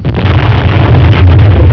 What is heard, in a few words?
BOOM